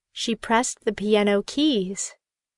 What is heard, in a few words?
piano